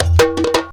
sabar groove; Best Service; World Colours

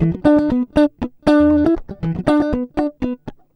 120 FUNKY, Acoustic Guitar Loops; Electric & Acoustic Guitar Loops; USB Soundscan vol.04; AKAI

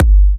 G, kicks; markus hakala kicks

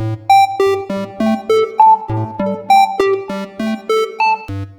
philter (acid trance); Sonic Foundry (Sony Creative Software); Ugly Remnants VOL-2

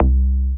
DM BAS, Dance Mega Synth; Best Service

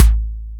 Boss DR-660 Kick, Drum Machines

